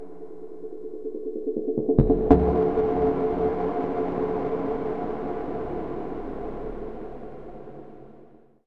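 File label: tormentor ambience; ambient soundscapes; dota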